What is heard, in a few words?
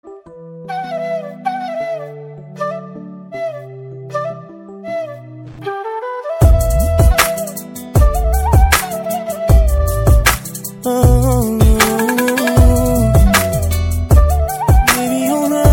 nice flute music